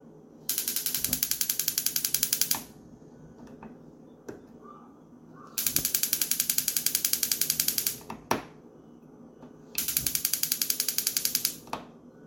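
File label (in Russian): включение огня электроплиты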